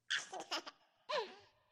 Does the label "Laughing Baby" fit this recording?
Yes